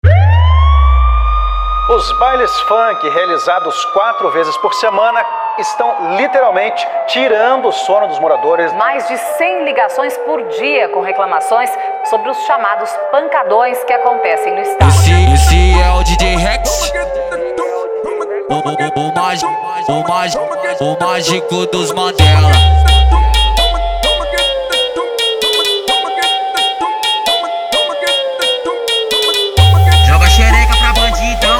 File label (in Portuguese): automotivo sirenado